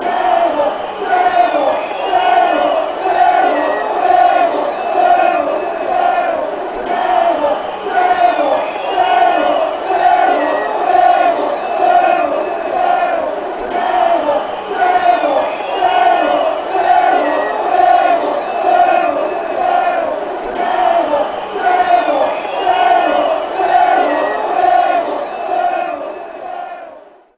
chants

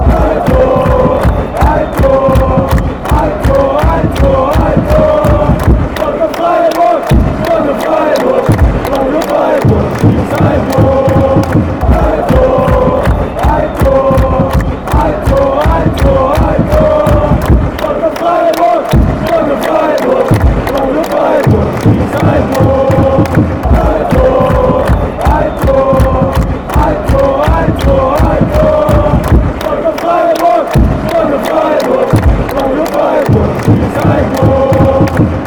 a goal, fan chants

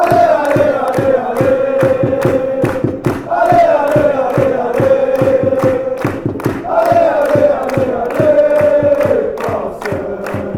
allez allez allez, fan chants

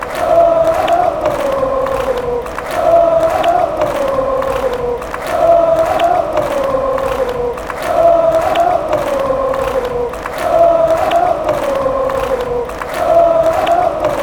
woah woah woah, fan chants

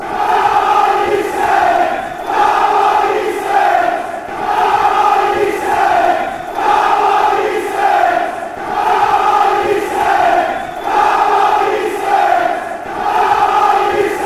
come you saints, chants

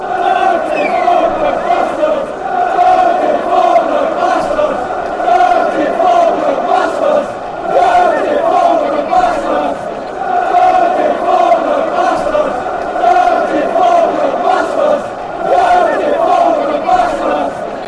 fan chants; dirty falkirk

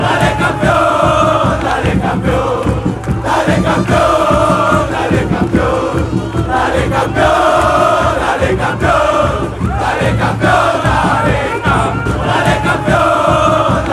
dale campeon, chants

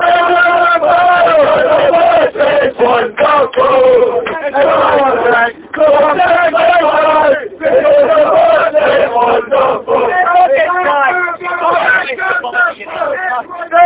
in full voice, fanchants; glasgow branch

